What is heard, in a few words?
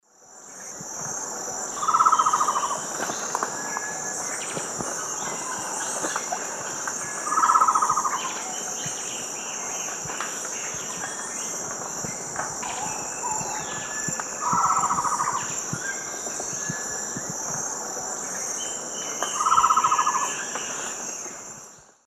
Mytmothera campanisona, call; S. America; Eastern Lowlands